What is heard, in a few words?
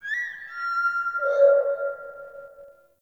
Bird Tropical, Ambience; Glades Of Andurin